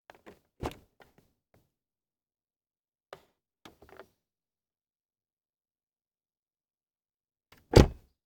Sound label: Subaru Car Door; Vehicles